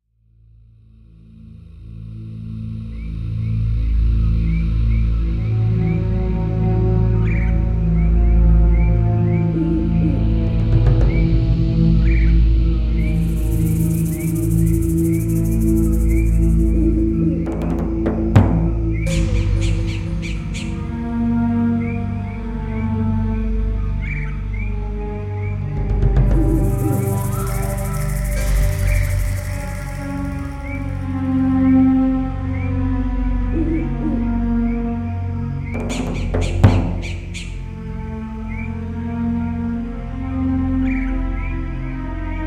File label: dungeon